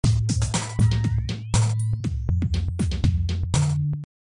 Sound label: randb